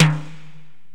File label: Korg x5, Drums